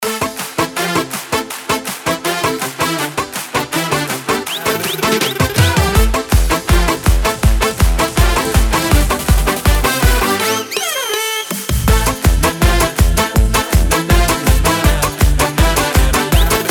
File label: dance folk; Autorska Dance Folk 14; craftstudio24